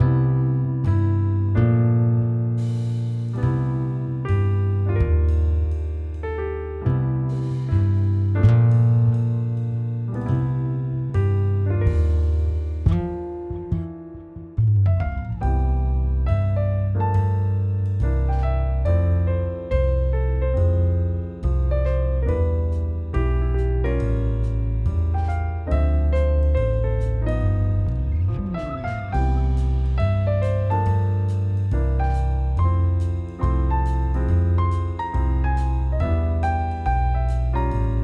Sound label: Smooth Jazz solo music smooth jazz song